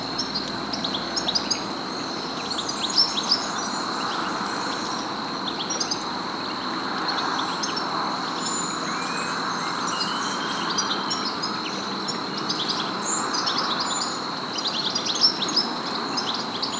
Carduelis carduelis; SETE

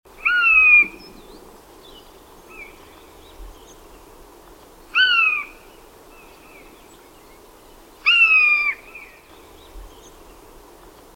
Garrulus glandarius; SETE

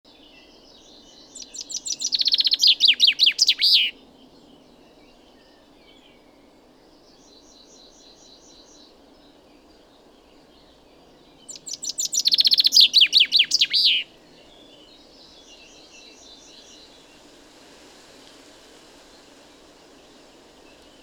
Fringilla coelebs; SETE

